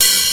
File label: muted open hi hat one shot f key, Royality free open hat sound tuned to the F note